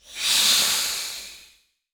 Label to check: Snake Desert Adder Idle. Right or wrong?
Right